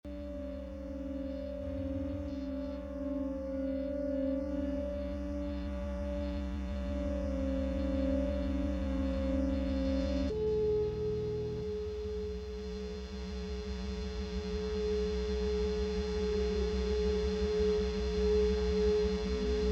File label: drone scary; the archive audium vi